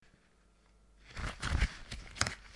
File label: gloves putting on